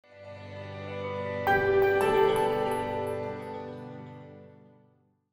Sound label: stratus, notifications; HTC One-X